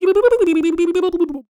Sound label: Mouth Fx, Vocal